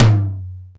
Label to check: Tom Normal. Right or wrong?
Right